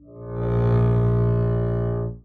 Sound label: arco bb1 ff down, double bass; dsmolken